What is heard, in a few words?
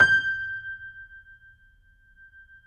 JAZZ G5, Keyboards Acoustic; AKAI; USB Soundscan vol.17